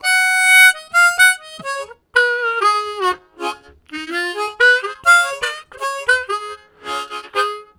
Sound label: Single Lined, Harmonica Riffs; Zero G